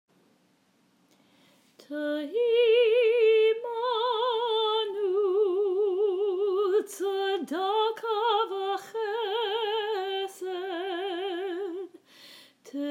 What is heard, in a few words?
melody, choir